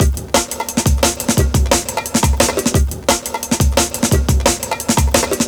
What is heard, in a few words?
C, 175, classic dnb breaks